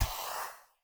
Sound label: Soft Slide Fast